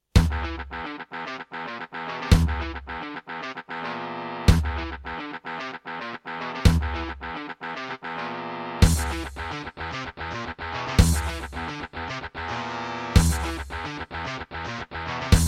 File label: Serdaco Dreamblaster X2GS NATIVE; Doom